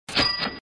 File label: kashing